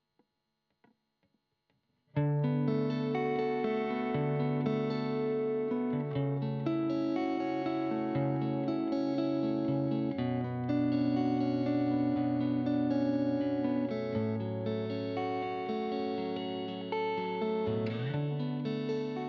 GTR Royer

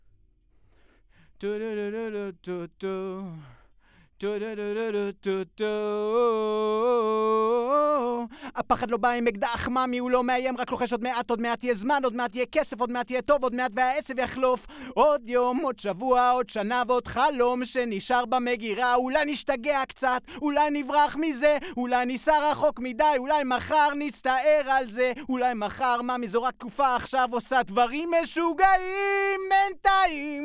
VOX

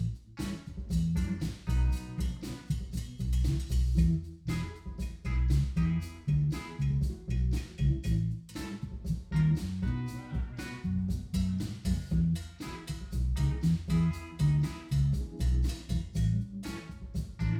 Acoustic

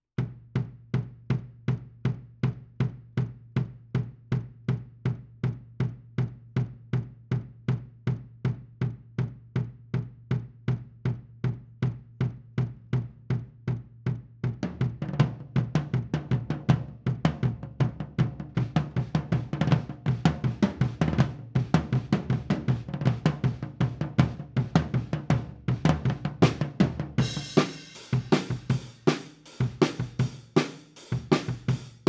Kit, 160 BPM

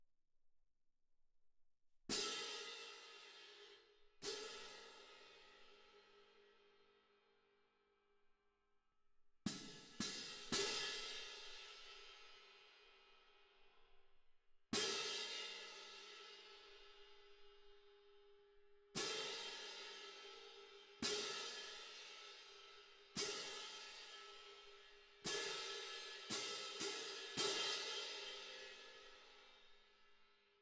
Perc

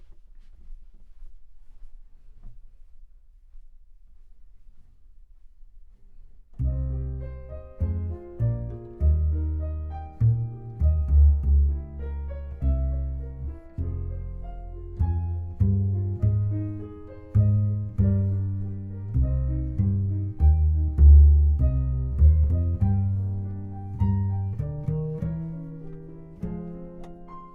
CBass Bot

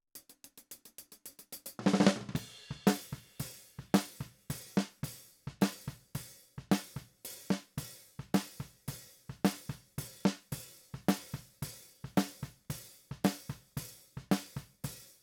Snare

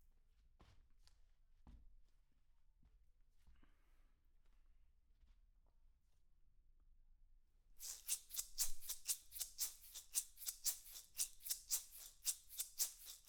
PERC OH

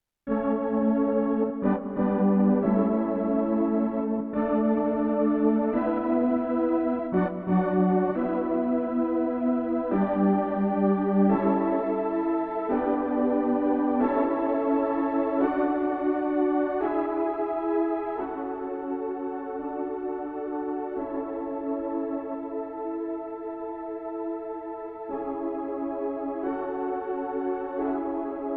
Keys

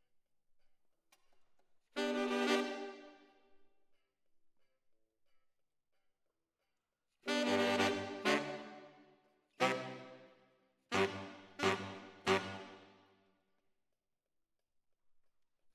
ORTF SCHOEPS, piano

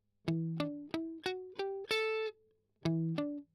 Strum Octav Arp

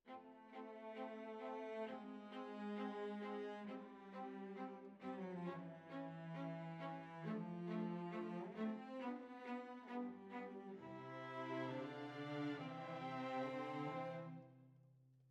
Violas, jazz for piano

